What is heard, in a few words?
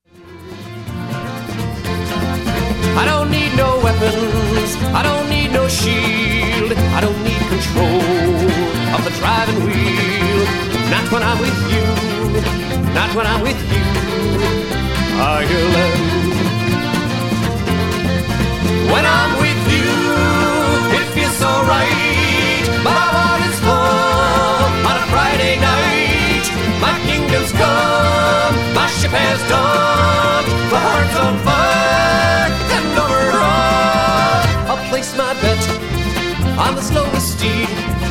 Irish Band